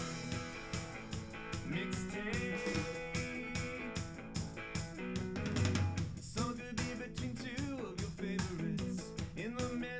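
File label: with drums, live recording, 250 ms, 5 cm, mix; SiSEC11; underdetermined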